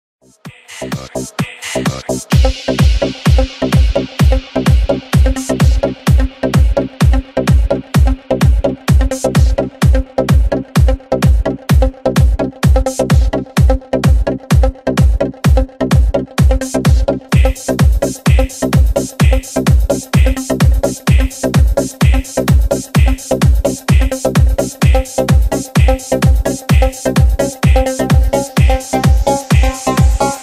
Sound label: electro dance EDM